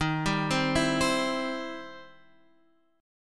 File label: E Minor Major 7th Sharp 5th, D sharp